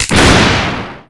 shoot

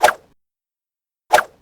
throw; aura

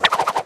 cane spin; bonny betty

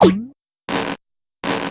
tug

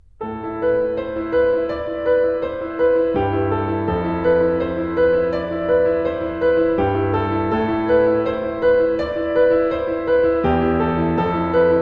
piano

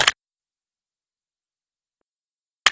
makeup

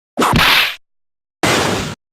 whip; franziska

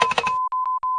sweat; matilda

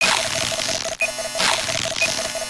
panicking

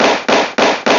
heavy clap

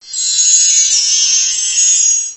dbling; red

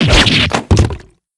damage short

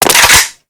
gun

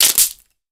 nail break

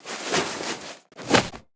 transformation; jean

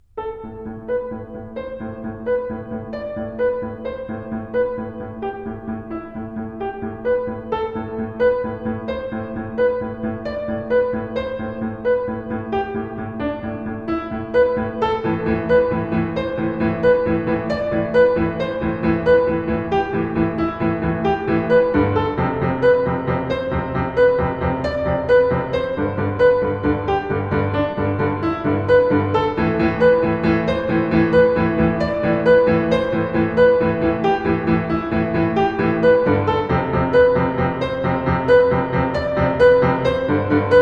piano, allegro

